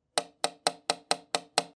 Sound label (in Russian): stuk v okno zvuk